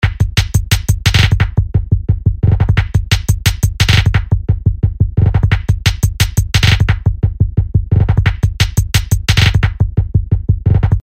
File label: 175bpm